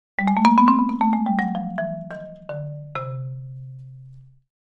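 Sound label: Marimba Short Ascending; mallets marimba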